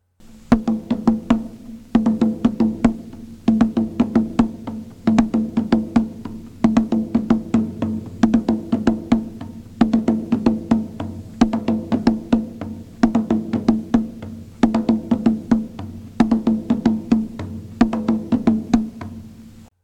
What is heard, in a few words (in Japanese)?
サンピンゴ・パターン（ニャウの太鼓合奏）; africa